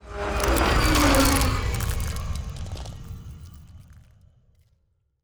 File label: ship science move; biogenesis